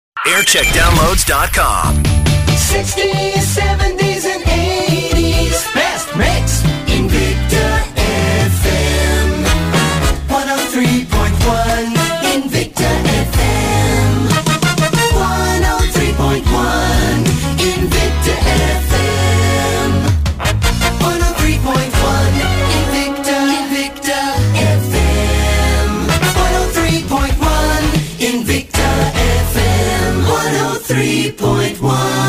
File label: Jingle Montage; Invicta FM; Century 21